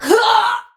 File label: Death; Warframe; Tau; Dax; Ninja